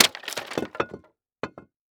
Crash Concrete Smashing Through Wood Debris On Pavement Short; Crashes